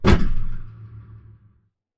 sliding door ext verb close start; Titanfall 2

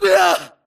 grunt death quick; Titanfall 2

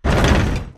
bullet explosive titan shudder; Titanfall 2

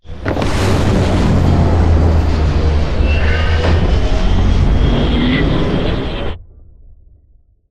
strafe; Beacon; Titanfall 2

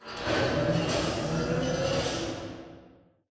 Robot Arm Pass Right Deliver Retract Dist; Boomtown; Titanfall 2

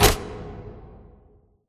Giant Pillar Pods Stop; Titanfall 2; Boomtown

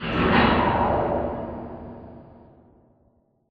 Robot Arm Track Stop Dist; Boomtown; Titanfall 2

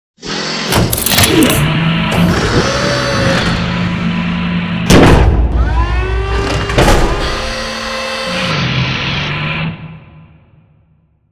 Assembly Wall Arm Close; Titanfall 2; Boomtown

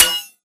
bullet light ballistic blades deflection

